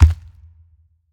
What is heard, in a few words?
step, alien